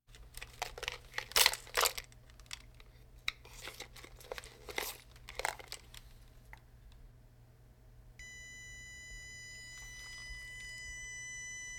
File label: Hospital effects